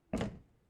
door push open

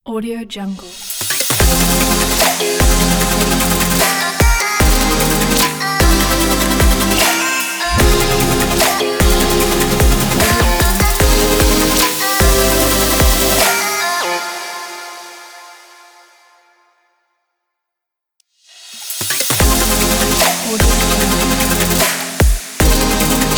future bass logo

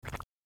quick mud blurp; hollywoodedge

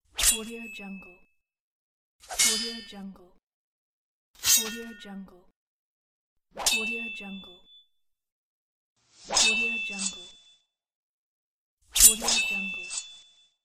sword sound pack

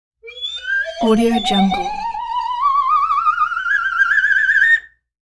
slide whistle crazy

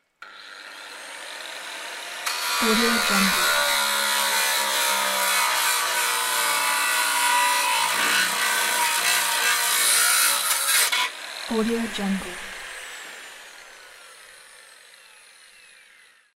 angle grinder cutting metal

